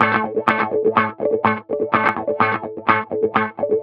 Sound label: Guitar Wah Loop, 125bpm; Zero-G; Nu Disco